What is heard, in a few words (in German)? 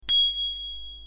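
Fahrradklingel präzis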